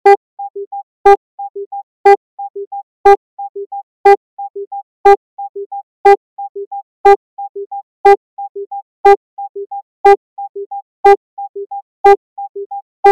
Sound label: 3 beats over 2 beats, rhythm; music